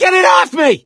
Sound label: scream while pounced; voice; source engine; left 4 dead 2; survivor; gambler